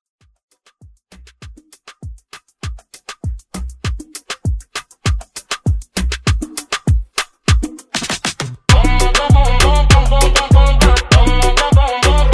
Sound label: Moombah Intro